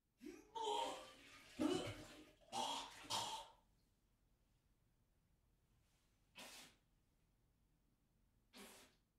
Vomit in Bathroom Sound Effect

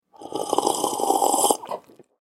Slurping sound

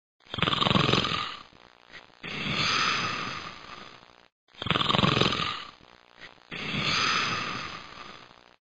Snoring sound effect

